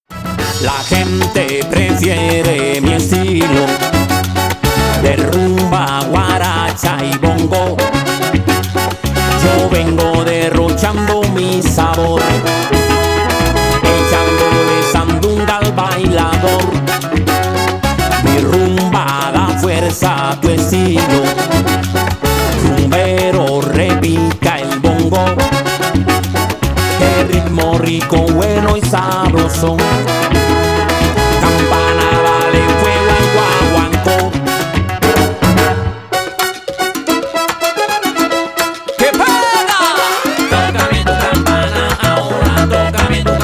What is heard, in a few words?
salsa; salsa hvk duo